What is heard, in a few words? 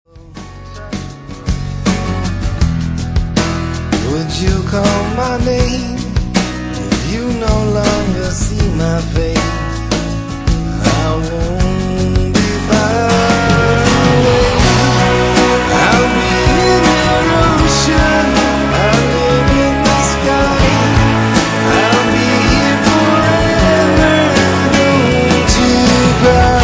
cry; Steven Seagal